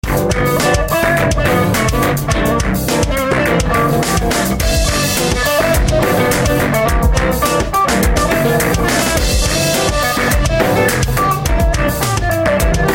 blues; peq blues

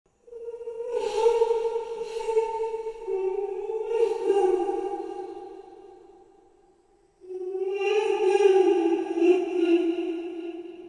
creepy

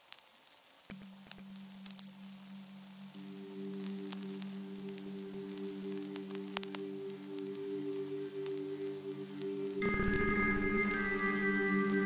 trap

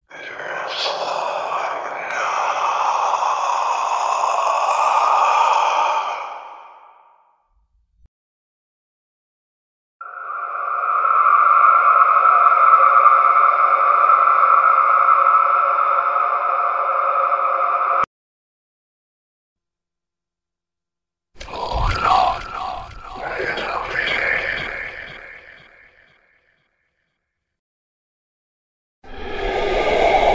horror whisper sound creepy sound

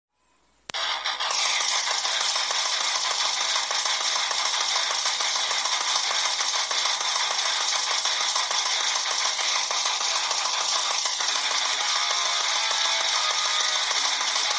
hardcore metal music

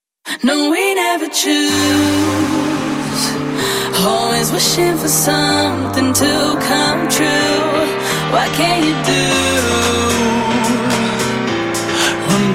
rave mix

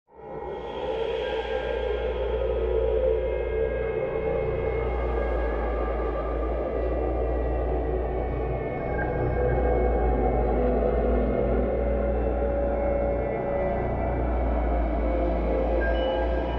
creepy demonic church singing horror sounds music

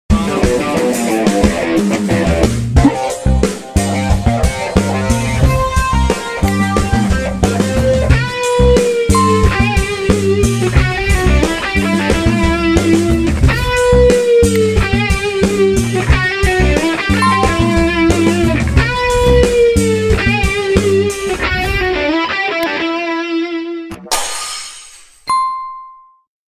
plataformas digitais instrumental

